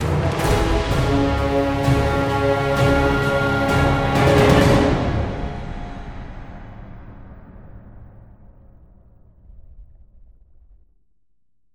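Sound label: sting death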